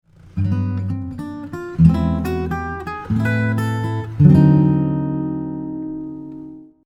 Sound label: first note is B